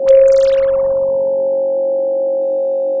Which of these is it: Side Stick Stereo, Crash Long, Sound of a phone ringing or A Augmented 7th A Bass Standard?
Sound of a phone ringing